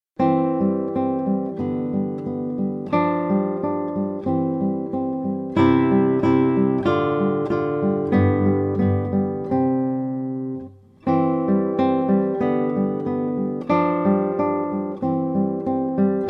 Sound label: andantino, guitar; carcassi